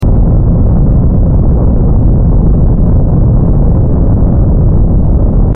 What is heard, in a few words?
rocket engine